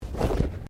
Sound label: Body movement Clothing Foley